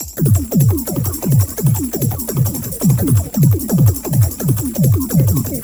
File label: 170, drum loops, drum & bass